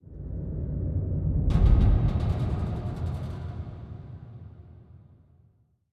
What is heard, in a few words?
submarines engines; World Of Warships